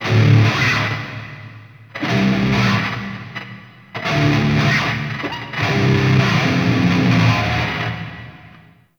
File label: Stadium Sound Check Guitars; Ambience; Warframe; NineteenNinetyNine